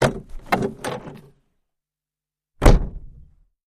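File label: Carriage Door Interior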